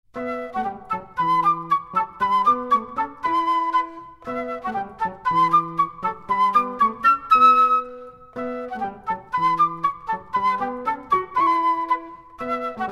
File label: Medieval Interlude